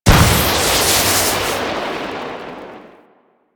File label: Lightning Machine Strike; Ambience; Props; Warframe; Entrati